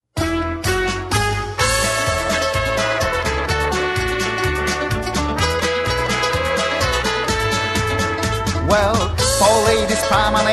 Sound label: vocal